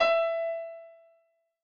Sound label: Concerta Piano